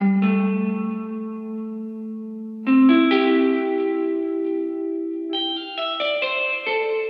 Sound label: guitar, Jazzmatazz loops